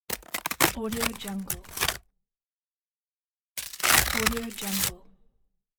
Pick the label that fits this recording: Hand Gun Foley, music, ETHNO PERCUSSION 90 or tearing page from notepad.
tearing page from notepad